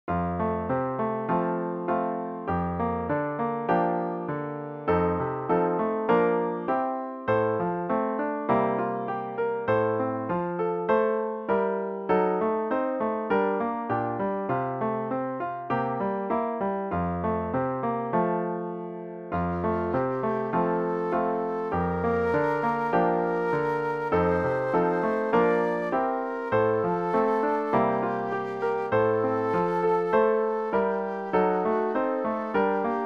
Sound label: enhanced piano